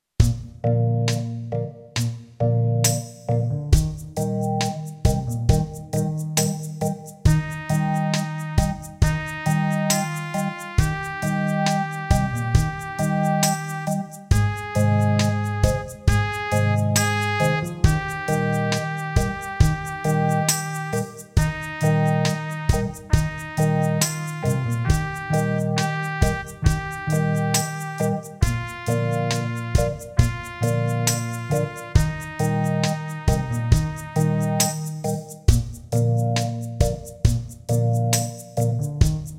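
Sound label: Pease in Eb, backing tracks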